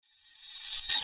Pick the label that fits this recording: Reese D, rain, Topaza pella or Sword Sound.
Sword Sound